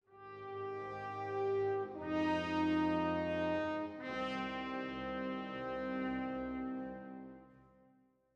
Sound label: Stinger Main Melody; Sudden Strike 4